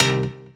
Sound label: synth rave C; peewee